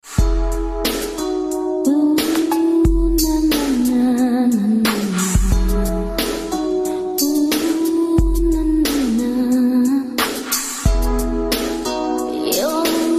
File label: yamaha dx7